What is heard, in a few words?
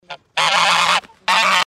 goose